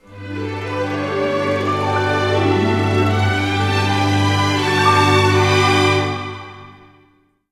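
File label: 80, orchestra; East West; Scoring Tools; Let's Fly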